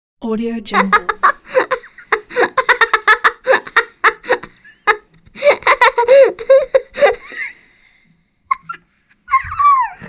kid giggles

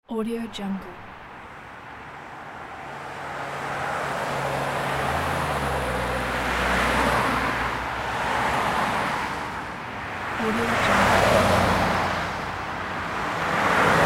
medium highway traffic

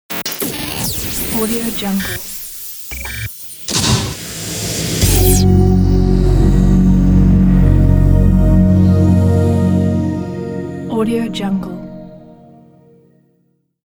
radio jingle ident

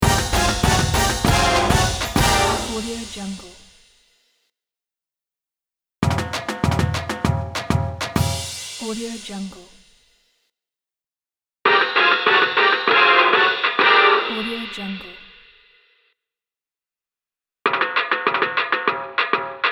upbeat marching band logo; drtimwaters

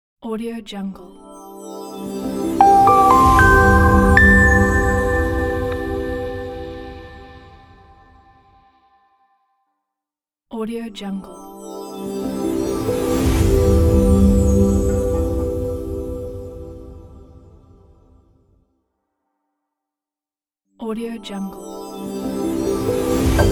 ambient logo explore

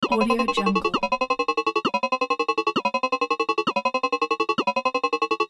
synth alarm with pulses and zaps

